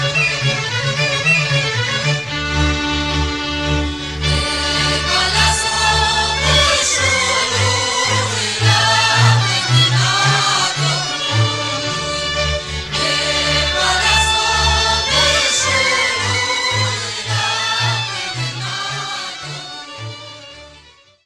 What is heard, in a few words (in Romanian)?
Suita de pe Valea Somesului - Roumanie